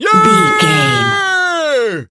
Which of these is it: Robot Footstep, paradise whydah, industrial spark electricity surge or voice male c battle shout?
voice male c battle shout